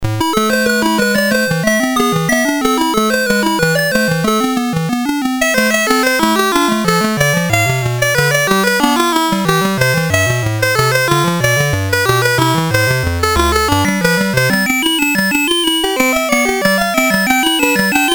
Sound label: Christmas Album BASIC, Commodore MOS6581R3; C64